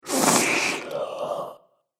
Zombie - Snotty; horror; Zomby Sound Effects

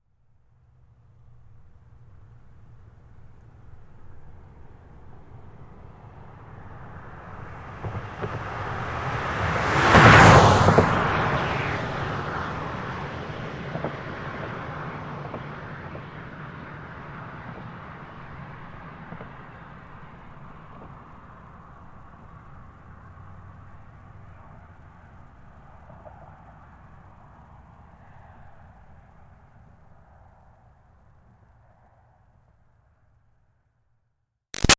Car Highlander By Fast; 2005 Toyota Highlander V6; Cars; Vehicles; Big Room Sound

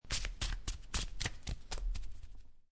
Playing Cards Shuffling Cards In Hand; Card Sound Effects; Cards (Sound Effects:Casinos)

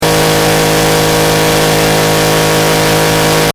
Engine: High Revs Loop, car; Cars; Vehicles; Stuart Duffield

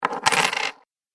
Coins Double Drop; Money; Andrew Fleming

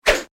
Whip Harsh Fast; Foley; Objects

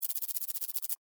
Insect Move Shake, Movement, Bug; Animal; BlastWave

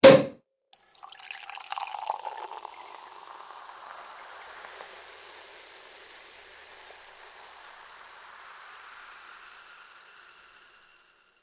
food drink champagne cork pop pour; Champagne Bottle Cork Pop Open And Drink Poured Into Glass; Bars

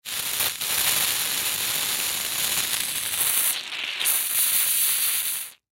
Silly String Spray, Silly String Spray Long; Wet; Spraying; Blastwave; Foley

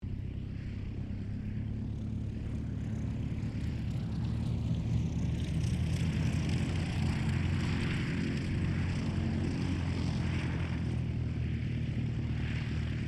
AIRCRAFT RYAN STM-S2 TAXI; 1939 Ryan STM; Airplane; Airplanes; Vehicles

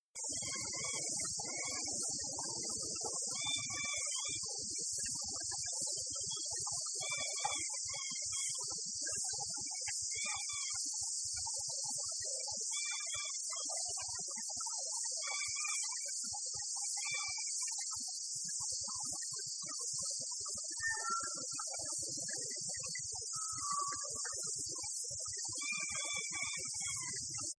Rainforest Cicadas; Nature Scenes

